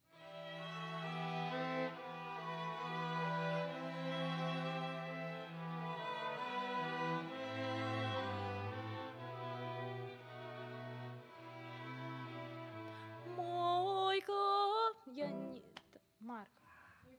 Vocal

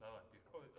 piano

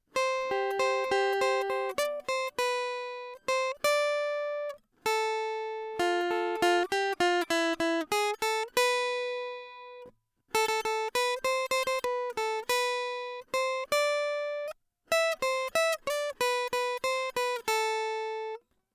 guitar nice

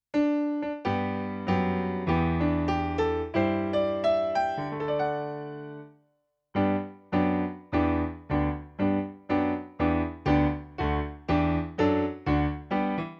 Piano

